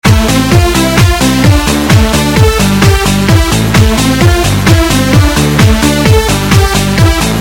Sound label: Loop